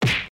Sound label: hit; Ben 10 - Tomb Of Doom